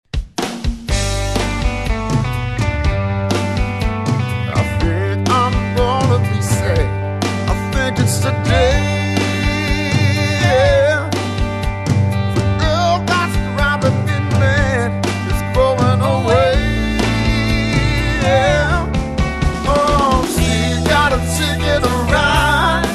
Live Band

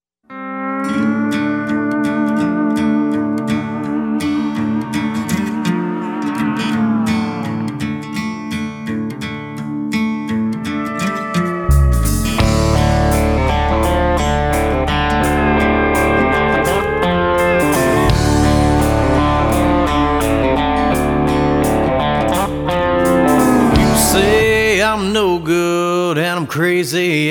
country band

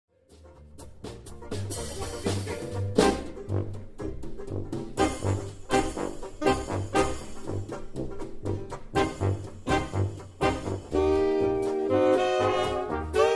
20s 30s Era Dance, String Quartet

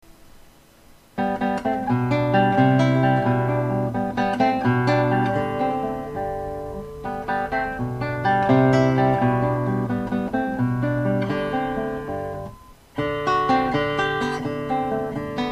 Waltz in G, Acoustic Guitar

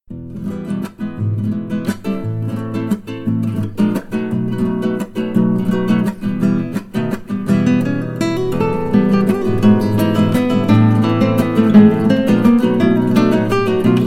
Venzuelan Waltz, classical guitar

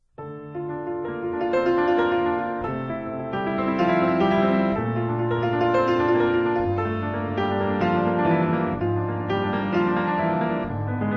Piano

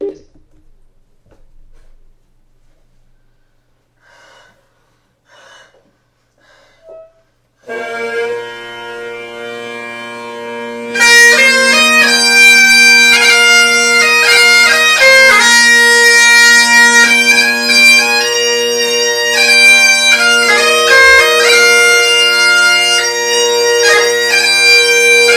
bagpipes

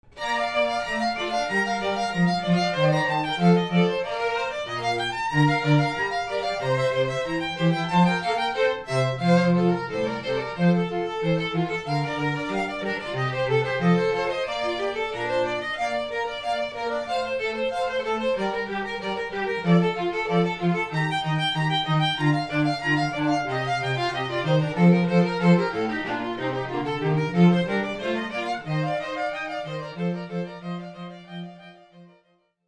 String Quartet

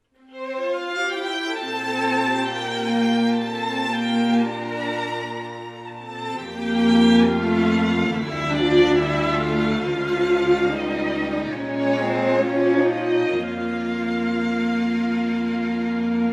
String Quartet

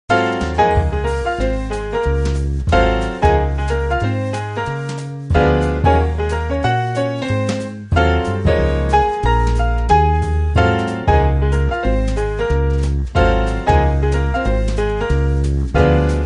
Trio

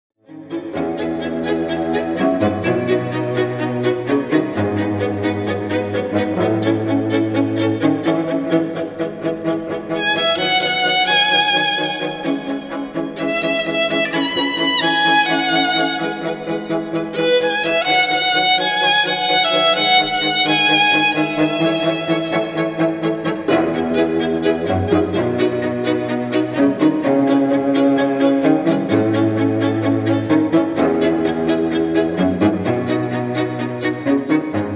Quartet, classical string quartet

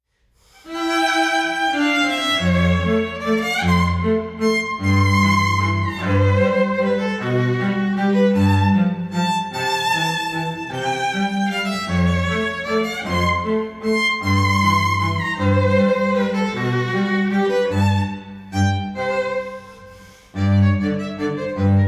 Violin and Cello Duo; Strauss; You and You

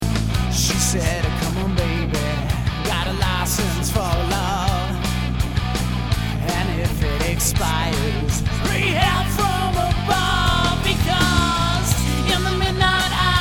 Live, cover band

